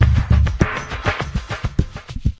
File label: liquid drum, drum loops and breaks, 100 bpm; 091 to 100 bpm